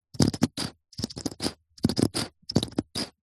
food drink bottle plastic sport squeeze water dribble nozzle